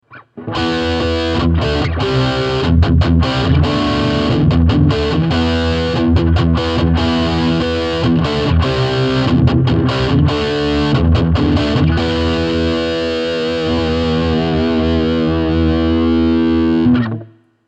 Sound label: metal, Roland Cube 40GX